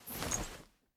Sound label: armor long; smite; hera